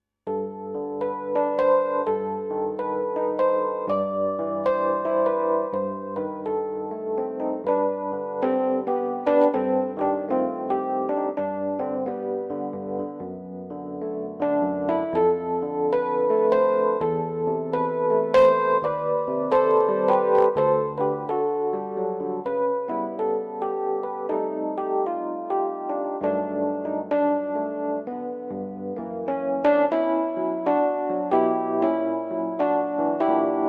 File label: piano, solo piano